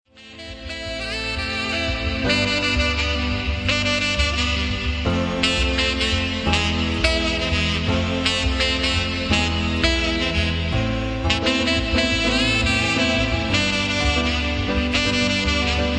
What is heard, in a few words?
romantic